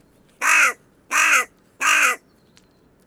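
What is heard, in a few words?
hooded crow corvus cornix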